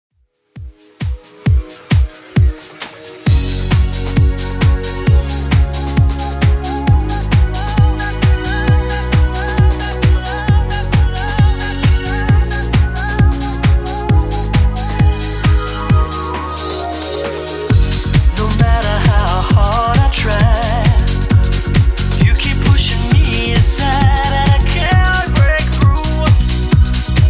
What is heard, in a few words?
90s DJ Friendly 133bpm